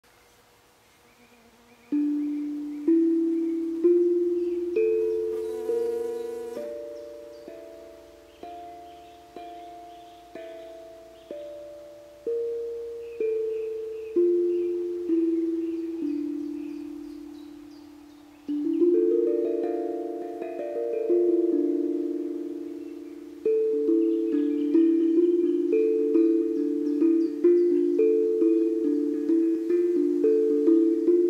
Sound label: pentatonic